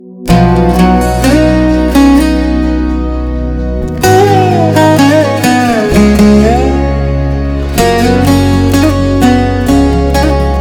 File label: STRING, free indian melody ringtone